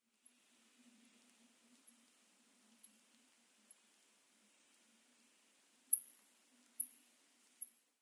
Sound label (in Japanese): koumori